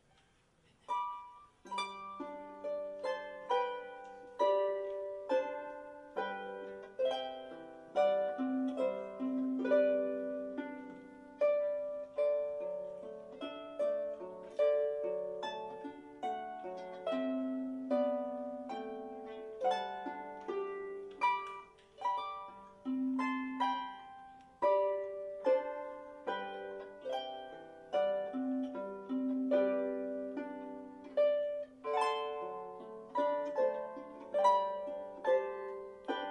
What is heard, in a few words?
w harp; Away in a Manger